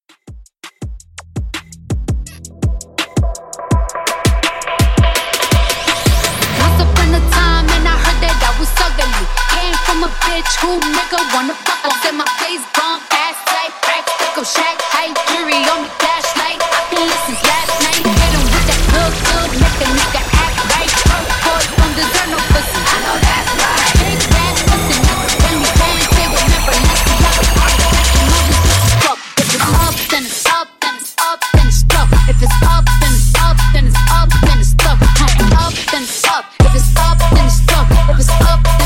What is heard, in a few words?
Hip Hop Dubstep; Remixfreakz